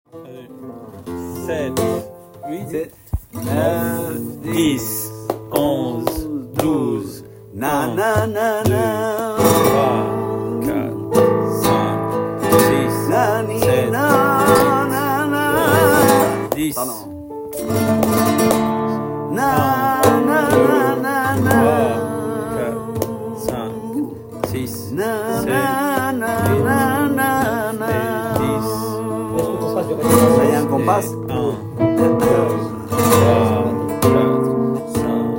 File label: letra solea, flamenco